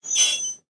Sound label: train brake screech; factorio 2.0.55